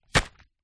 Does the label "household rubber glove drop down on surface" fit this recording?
Yes